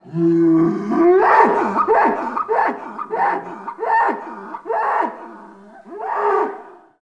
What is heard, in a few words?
Cattle, Cow Bellow, Yell. Multiple High-pitched Bellows, Fast Yells, In Pain. Medium Perspective.